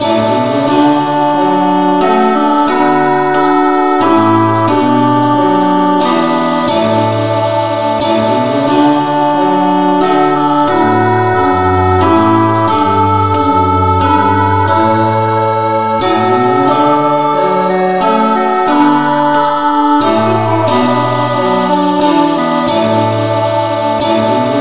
mcintosh rev no vocal key d

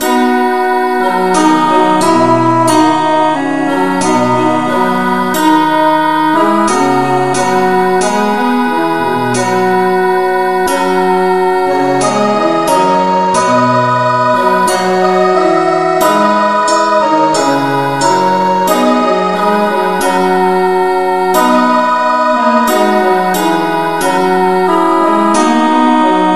no vocal key b; Father, we praise Thee, now the night is over; christe Sanctorum

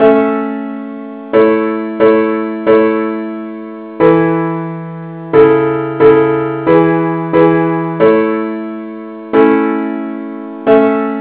henley parts only key a

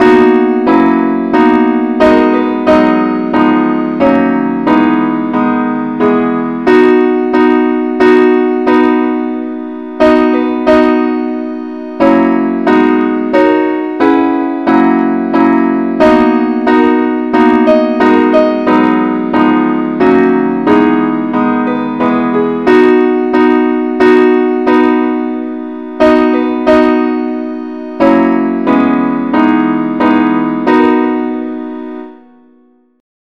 keyb; Amazing Grace is the beginning of a line from John Newton's poem "Faith's Review and Expec

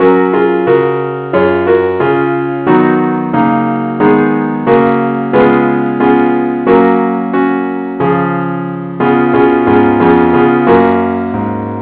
gwalchmai keyboard Only key fis

